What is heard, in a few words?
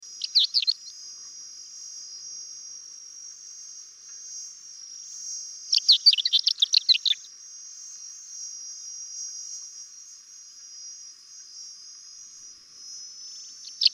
fawn colored lark